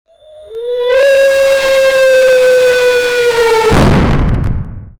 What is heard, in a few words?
phenoix scream with fire, mythical